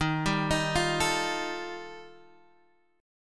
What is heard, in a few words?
G 7th Suspended 2nd Sharp 5th